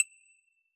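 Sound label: sequencer click high; AnlgKit1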